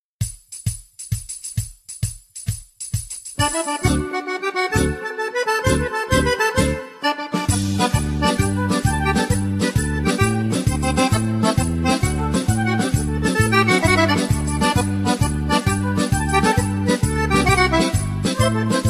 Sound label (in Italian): tarantella